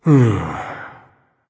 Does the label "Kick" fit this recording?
No